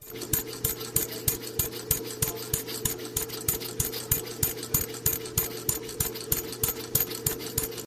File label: Pump